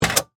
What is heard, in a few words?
Gear Switch On, Foley, Gear, Switch, On; Clicks; Objects; BlastWave